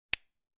gun 1911; weapon; still north media

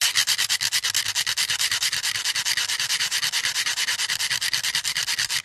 styrofoam on styrofoam scraping back and forth; Sound Ex Machina

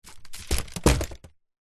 household basket picnic hamper drop

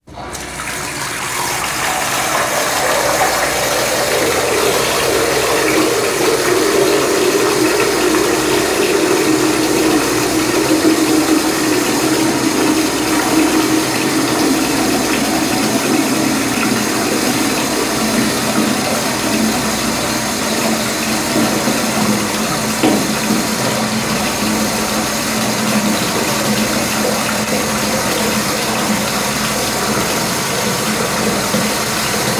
household bath fill with water full; sound effects one

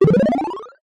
multimedia game sound classic 1 up

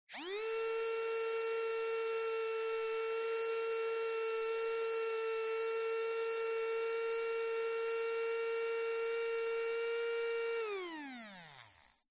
small electric servo long hold; the dentist; silverplatter audio

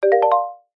game tone marimba positive generic; zapsplat

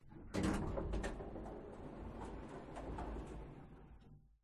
elevator door closes; la paz; your free sounds

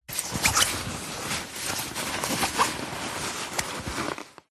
luggage bag rucksack handling movement; zapsplat

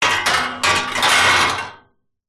metal frame bed loose hit rattle; impacts

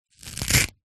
household hairbrush hair rip pull from bristles

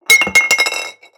Wine Bottle Empty Knock Over Concrete

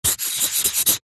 pen sharpie sign signature; office

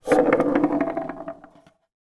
foley battery aa roll table; zapsplat

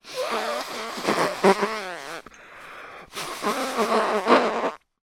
human blow nose into tissue

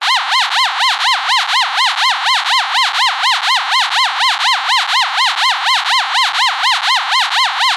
science fiction alarm siren small plasticy

